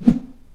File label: swing; SimplyGladiators